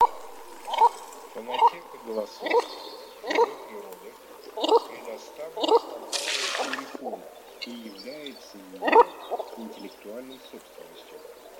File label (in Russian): Клоктун Alzavola Asiatica